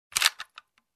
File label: Ejecting Magazine from Toy Gun